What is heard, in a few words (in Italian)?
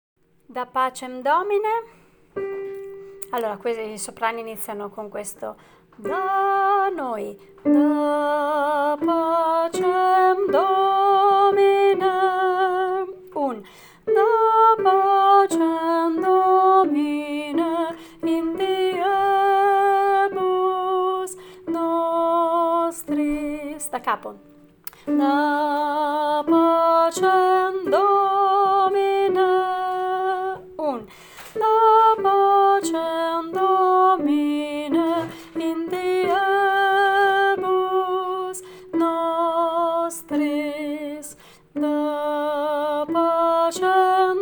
da pacem domine contralti